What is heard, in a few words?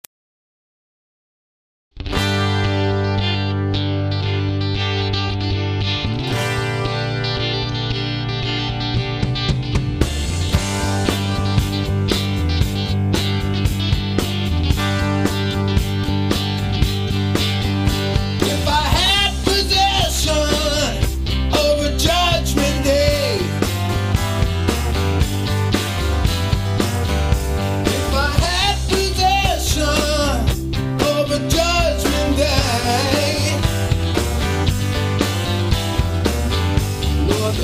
Blues 3 Roots Rock